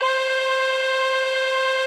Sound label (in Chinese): ZUN号 C6 开头